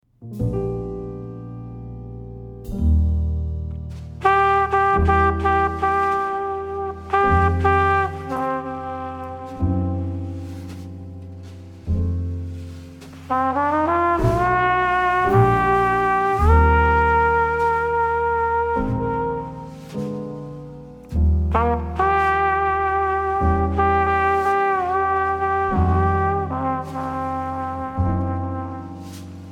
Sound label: Old Standard on the Sax, old school jazz standards